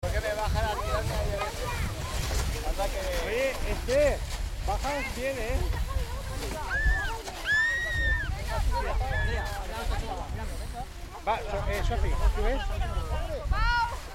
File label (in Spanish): Ambiente Trineos Formigal Urbanizacion